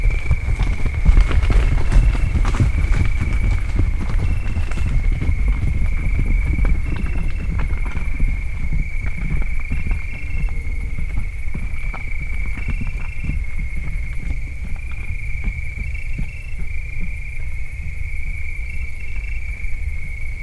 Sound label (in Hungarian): a vadászles alatt szarvastehenek futnak el; termeszetben; allathangok; kozepcsala